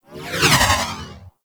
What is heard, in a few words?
Ball Projectile By; Warframe; Tenno Ball; Missions